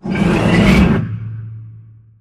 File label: sliding door ext verb open slide; Titanfall 2